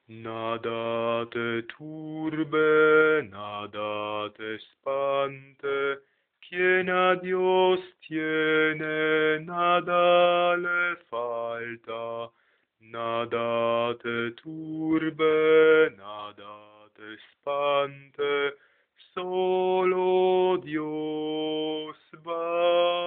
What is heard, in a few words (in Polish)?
Nada te turbe bas